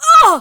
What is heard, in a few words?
Pain, Barks; Kuva Lich; Persona B